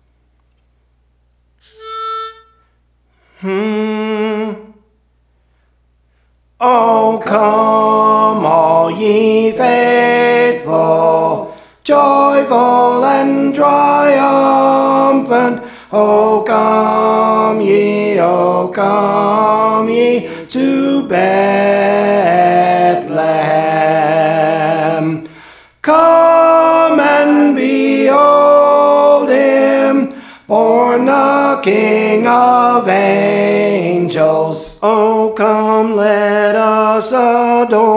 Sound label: Soprano, Songs; Praise For The Lord Training; O Come All Ye Faithful